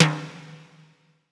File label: Lo Timbal, percussion reverb; MARKYDRUMS